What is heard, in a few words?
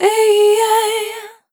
Dry, Multi-Voice Loops, Vocal Samples; Extended Family; Sonic Foundry (Sony Creative Software) - Pocket Diva World Class Vocal Samples